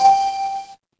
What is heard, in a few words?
feedback success